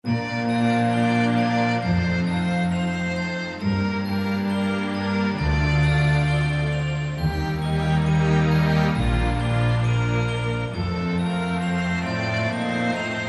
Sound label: jolly old st nicholas